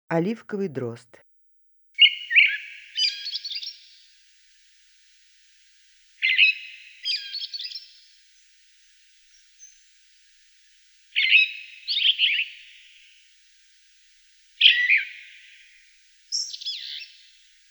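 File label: olivkovyy drozd golos, olive thrush voice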